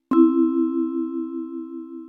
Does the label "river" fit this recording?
No